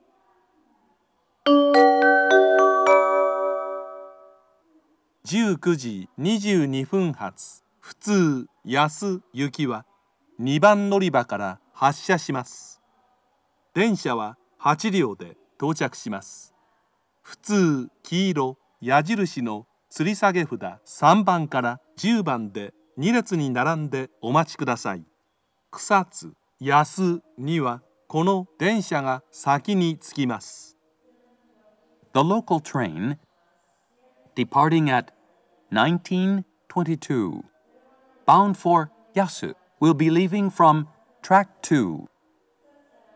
local, kyoto, station; yasu; jr-west

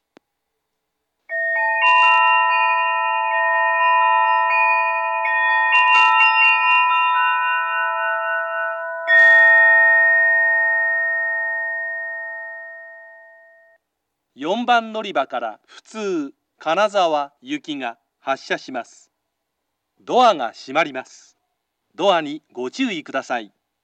takaoka local kanazawa, station; 普通; 金沢; 普通 金沢行 2両編成です。

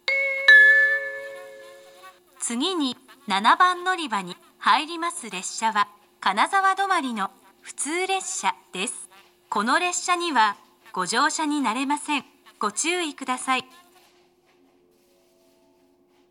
kanazawa stop local; station

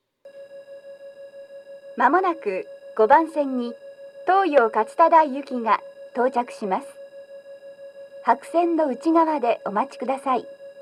nishi funabashi toyo katsutadai rush, station

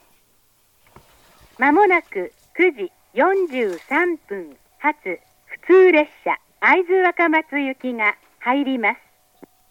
higashi niitsu local, station; jr-east; aizu-wakamatsu

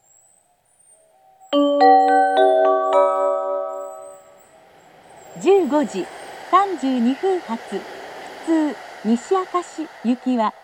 local, station; tachibana; jr-west

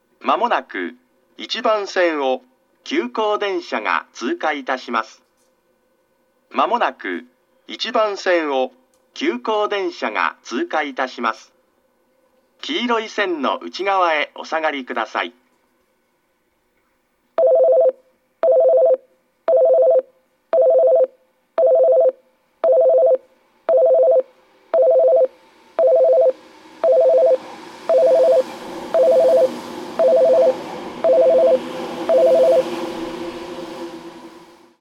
tsukushino pass express, tokyu station

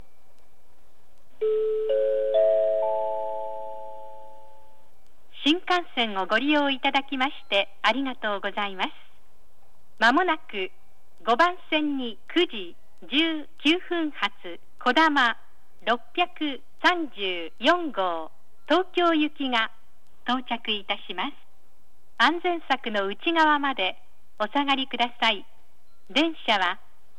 shizuoka kodama, station; jr central; tokyo

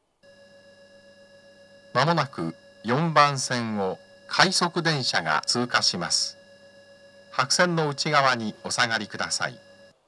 myoden rapid pass; 妙典駅 4番線; 接近放送; 通過; 快速電車通過時の放送です。; station; tokyo-metro

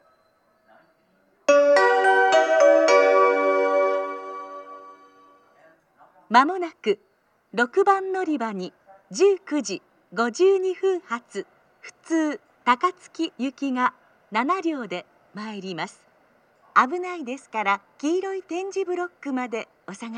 amagasaki local takatsuki; station; jr-west

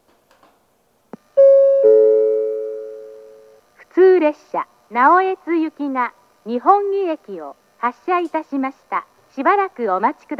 arai local, station; jr-east; naoetsu

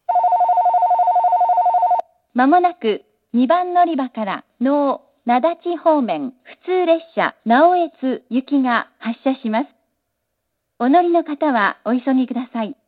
local naoetsu, itoigawa station; echigo-tokimeki-railway

